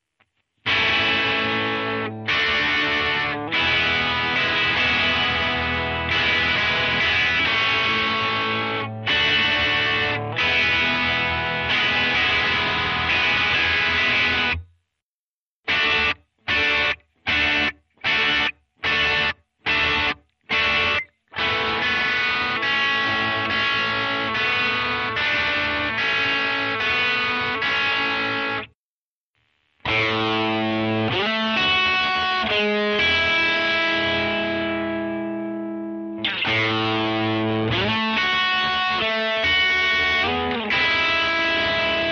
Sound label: Rock guitar; yao gun ji ta